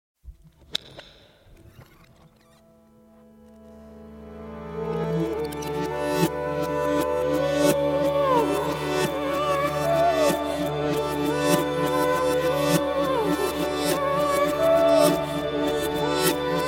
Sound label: Instrumental